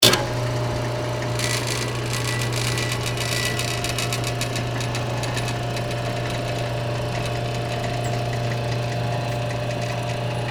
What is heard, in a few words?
Hangar Door Open, Aircraft, Hangar, Door, Open, Interior, Perspective; Transportation; Airplane Sound Effects